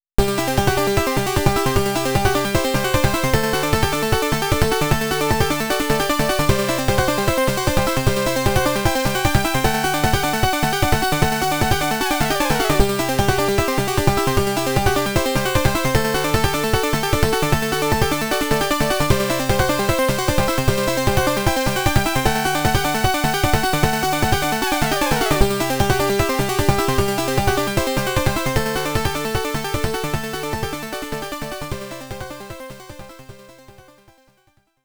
Boss Battle Ship BGM, MSX; Nemesis

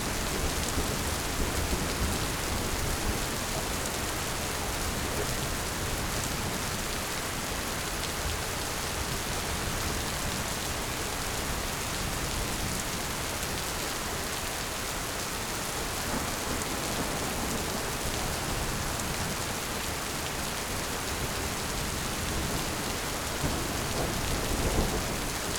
Rain; This Is the Police

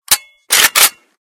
WEAP GATLING RELOAD

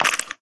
Sound Sign Food; Peace, Death

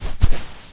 footstep sand walk

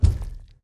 Giant Stomp; Lethal Company; Windows

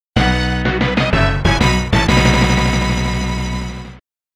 Huge Success Fanfare; Ganbare Goemon Sound Treasure Box; Planet Tresed Boss

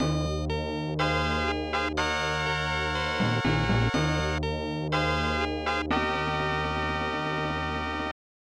Final Fanfare; Jutei Senki; SNES

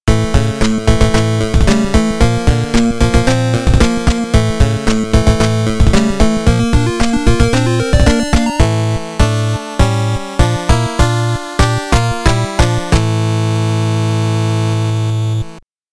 Dragon BGM Opening; konami famicom chronicle vol.3 rom cassette edition

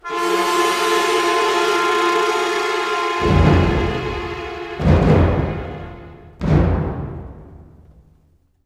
City Street and Foundry Sting; Veblensk; Naszran; Xbox

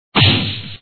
hit; pencak silat 2 2002 gamerip online

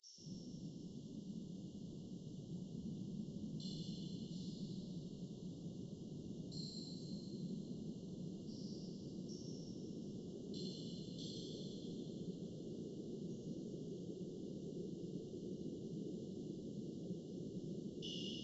Cliff ambient; Even the Ocean

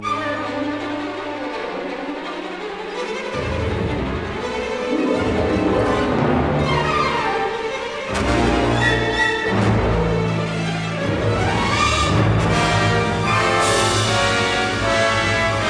Pod Loop

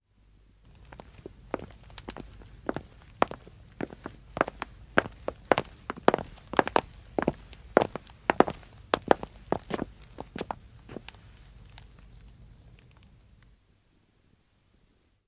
Footstep Male Wood; BBC; The 39 Steps

